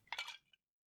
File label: Impact Flask